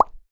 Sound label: pop